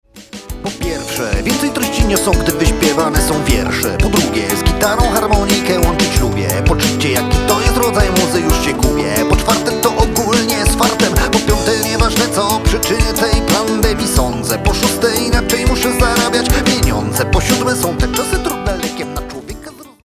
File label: hot16challenge